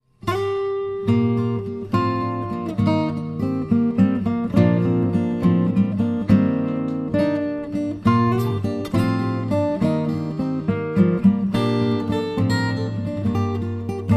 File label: Instrumental